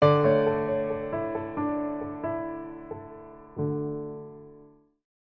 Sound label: Delicate Piano Etude